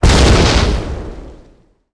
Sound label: mine explode